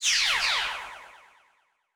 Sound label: Slot Lose